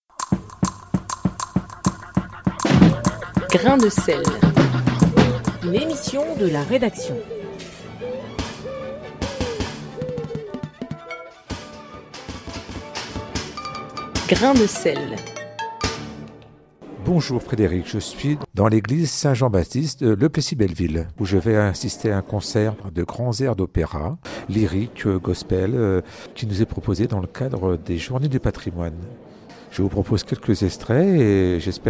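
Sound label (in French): concert l'église; JOURNEES DU PATRIMOINE; le plessis belleville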